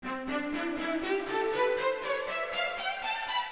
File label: violin; violin2 revised; The Interactive Alphabet